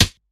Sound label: sparkle snare drum g key, steel snare drum sound tuned to the G note; snare drum samples